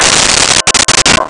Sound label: Tube Bells C5; Nintendo; July 2020 Gigaleak